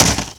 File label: Ice Break With Hand